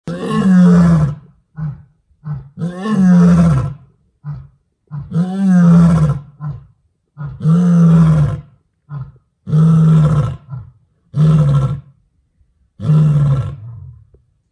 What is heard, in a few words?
Kariba calls